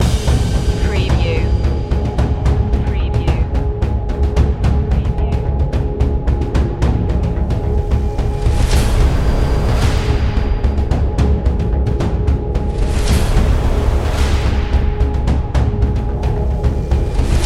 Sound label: black banner loop